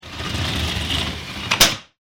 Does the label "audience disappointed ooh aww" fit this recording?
No